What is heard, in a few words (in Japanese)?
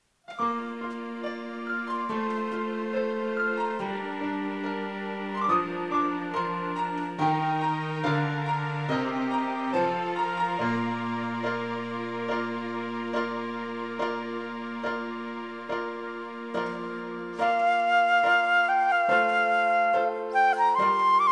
sample fue, taiko